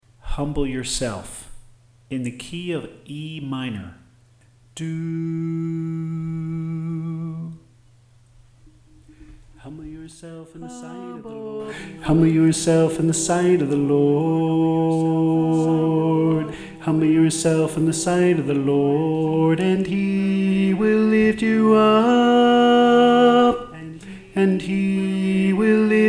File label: Soprano